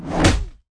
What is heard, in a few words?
parry